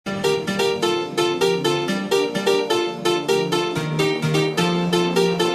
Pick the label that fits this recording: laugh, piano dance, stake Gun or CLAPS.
piano dance